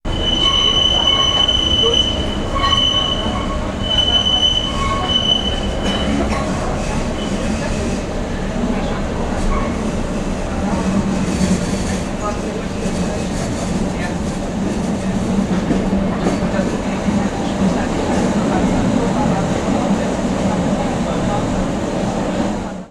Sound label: Berlin Public Transport